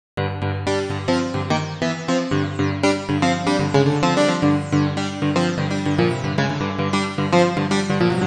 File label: The Sequential Circuits Prophet 5